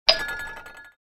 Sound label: enter